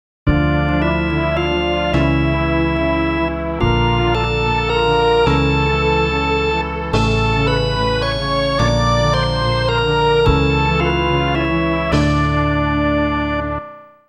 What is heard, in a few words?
gameover